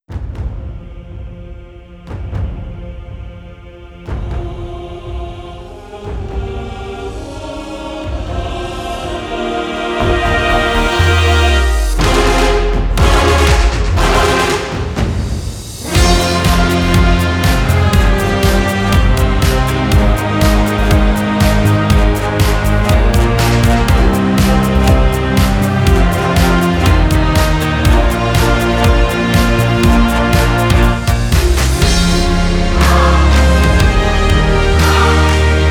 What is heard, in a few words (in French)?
Hymne